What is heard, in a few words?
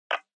Handheld Transceiver Noise Short